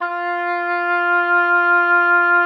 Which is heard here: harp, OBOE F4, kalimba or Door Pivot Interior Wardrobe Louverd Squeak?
OBOE F4